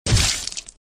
Sfx Hero Dead

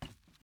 wood step; teardown; ps5 windows xbox series xs

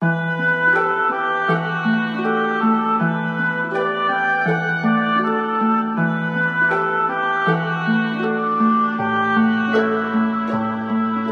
Dungeon; Miden Tower; Android

